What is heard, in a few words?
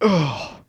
Pain, Bad Vocals